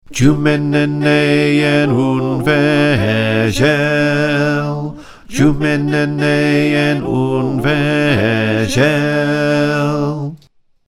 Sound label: Bass, choir